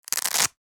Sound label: Velcro wallet open; purse